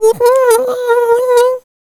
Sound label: VOICE FX, Dance Vocals